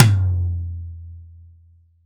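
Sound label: LD Mid Tom, Roland BK-5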